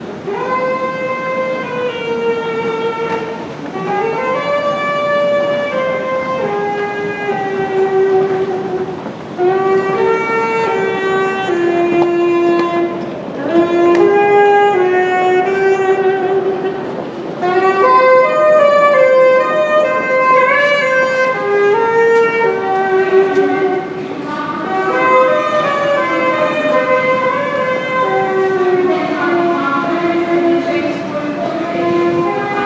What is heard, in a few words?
busker at chancery lane tube station